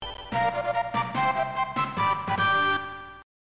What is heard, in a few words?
bgm; micky